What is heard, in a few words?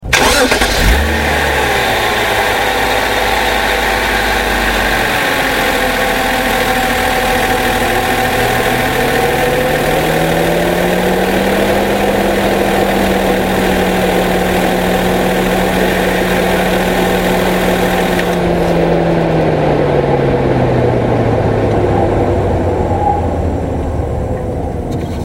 325i Sports Ignition Air Cond Fan Outside